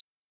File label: shimmer